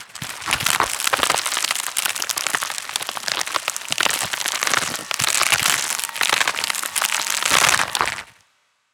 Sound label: Texture; Sound Design Tools; Textures